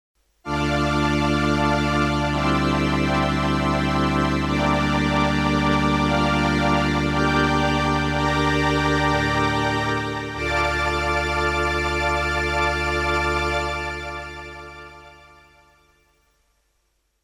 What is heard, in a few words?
Full string ensemble; Hohner